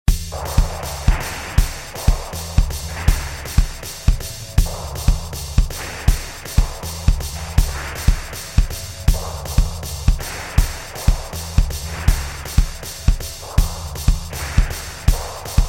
experimental sound; ukrainian experimental sound

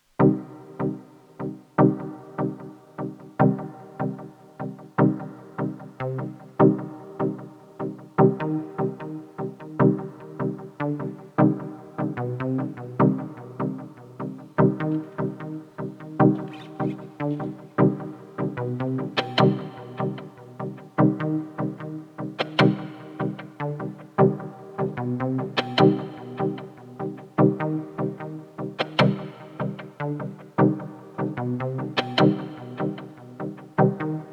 Dub; ODGPROD

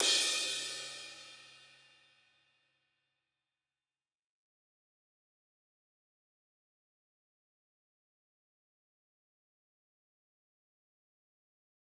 Crash, dub; ODGPROD

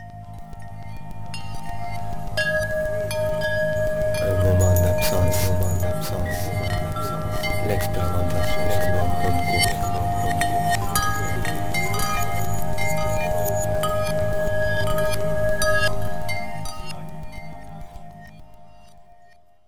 jingle; un moment d'absence

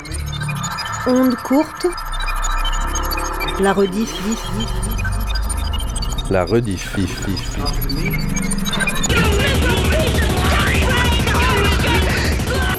jingle

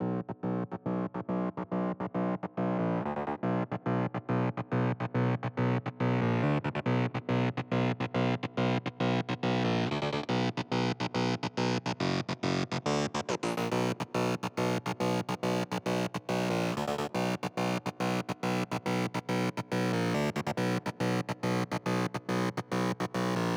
Synth; ODGPROD

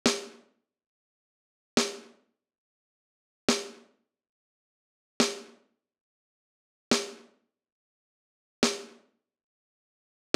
Snare; ODGPROD

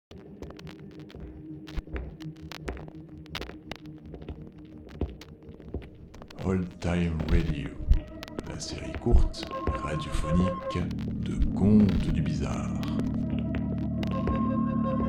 jingle; oldtimeradio

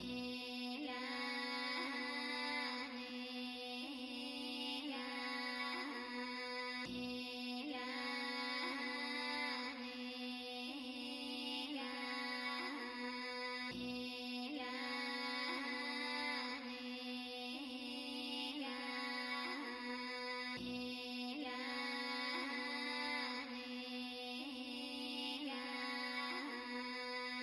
Vox, dub; ODGPROD

